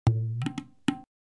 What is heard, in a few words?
house